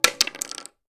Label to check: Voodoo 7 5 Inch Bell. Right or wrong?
Wrong